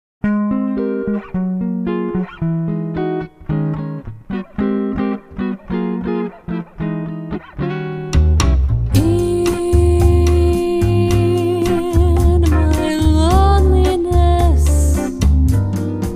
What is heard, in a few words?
Meditation